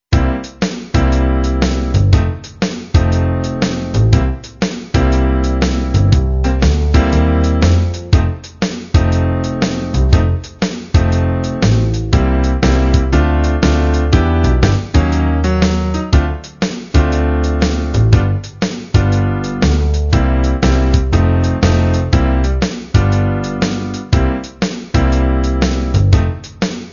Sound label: blues